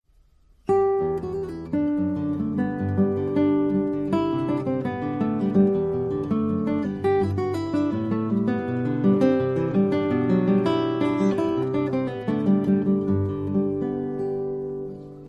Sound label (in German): Gitarrenmelodie